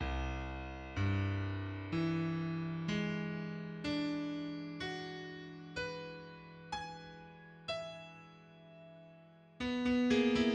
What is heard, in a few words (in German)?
Jodler, Klaviersuite